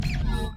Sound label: menu spawn; lucasartsonline